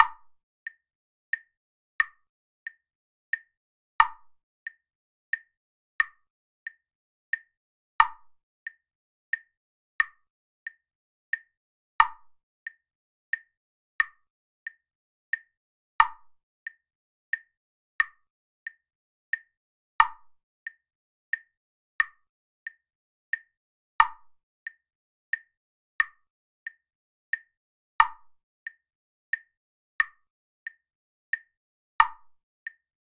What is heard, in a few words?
6/8 at 90 bpm; Metre (music)